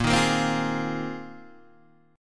Bb 7th Suspended 2nd Suspended 4th Bb, Guitar Open D